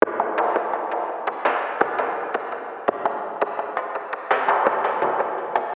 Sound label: loop